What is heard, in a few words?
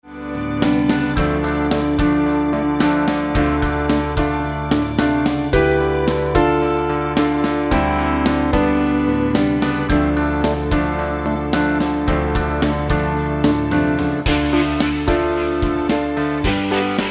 Bass Fusion Jam